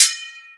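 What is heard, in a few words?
sword ringing high short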